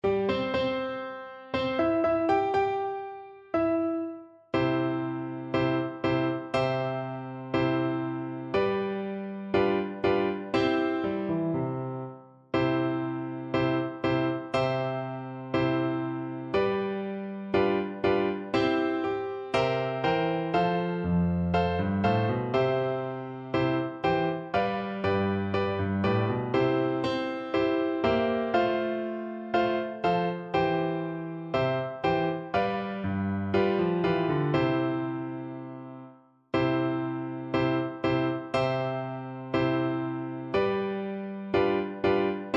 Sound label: chevaliers FL kar, Flute